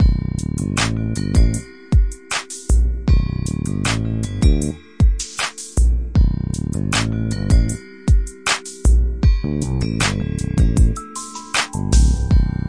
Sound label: 078 gamelan bass groove; REMIXABLE COLLECTIONS